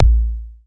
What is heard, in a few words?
Yamaha TX-81Z BD